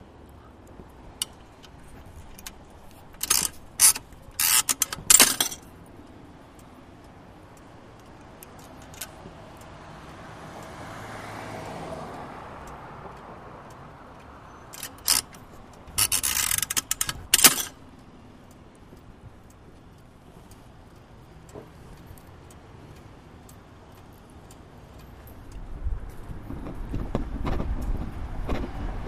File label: Meter Parking Insert